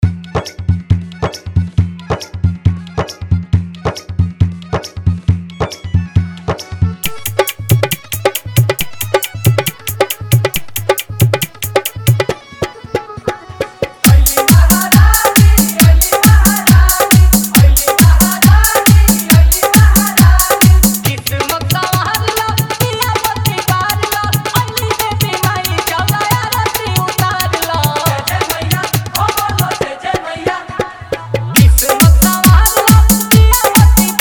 New Bhakti Hard Dhollki Bass Mix

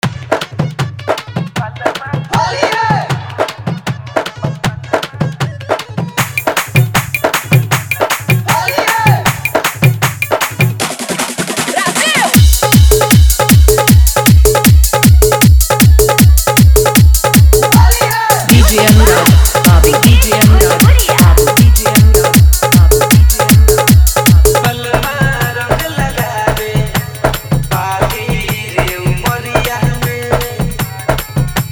Desi Hard Dhollki Bass Dance Remix

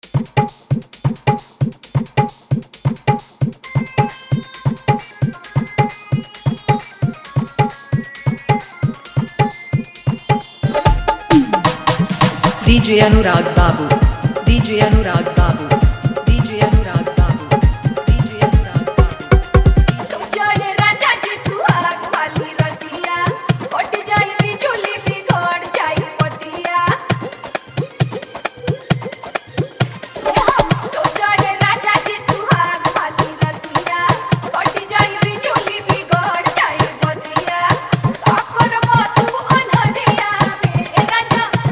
Bhojpuri Dj Song Mix

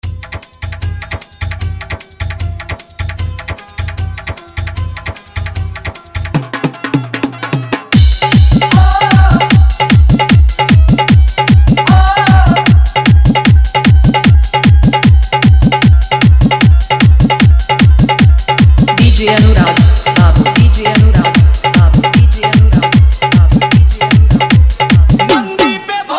Bolbam New Hit Full Dhollki Bass Rod Dance Mix

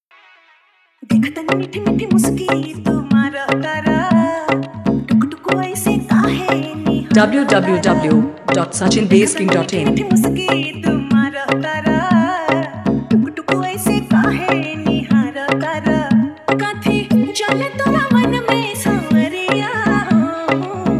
Hard Vibration Bass Mix

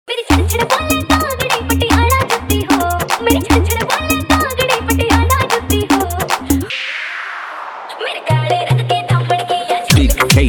Dj Full Fast Bass; GMS Bass

